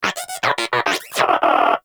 Inquisitive Vocal Battle Ends; Pets; Warframe; Moa

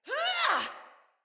Attack Heavy Female; Battle Barks; Envoy

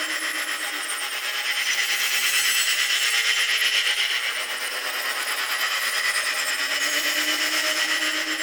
Fairy Move Loop; Warframe

